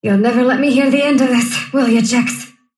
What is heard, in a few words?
sylph death; dota; dark willow